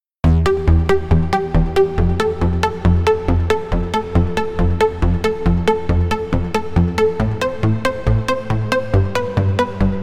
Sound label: cosmic plucks